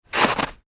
human footstep snow icy; Single Footstep On Icy Snow

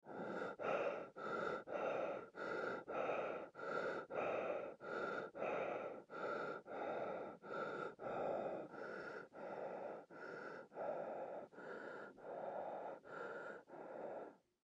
Gas Mask Breathe In Out Fast Multiple; Military; Breathing; Warfare; Blastwave

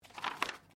Book Page Heavy Turn; Book movement; Books (Sound Effects:Offices)

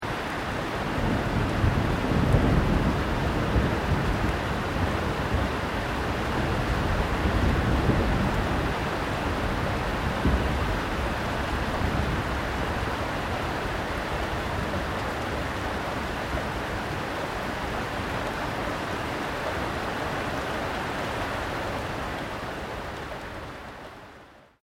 THUNDER DISTANT LOW RAIN; Elemental weather effects

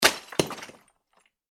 impact rock rubble; Materials; Impact - Rock Thrown / Dropped Onto Rubble; Rocks; McKinneySound

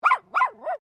Animal Dog Poodle Miniature Bark; Various domestic dogs

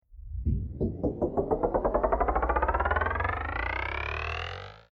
Riser Household Door Creek Pitch Up Wooden; Sound Design; Rise And Dive; Tom Hutchings

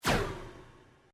Created sweep pulse quick; Sweepers; Sound Design; Various sweeps, pulses and drones; SoundEffects Foundation2

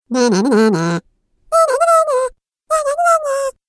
Cartoon Game Voice Mocking Rude Sounds

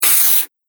Pencil On Paper; Writing Sound Effects; Foley

